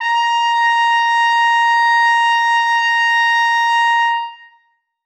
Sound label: trumpet a#5; Sonatina Symphonic Orchestra